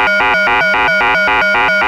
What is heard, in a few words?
Moog A#3, Synthesizer; Hollywood Edge; Giorgio Moroder Rare Synthesizer Collection; MLTI.MOOG